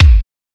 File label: mid heavy kick single shot b key, Royality free bass drum one shot tuned to the B note